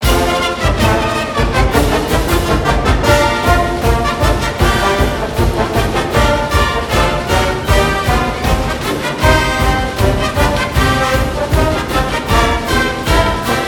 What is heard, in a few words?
Broadcast Fight Song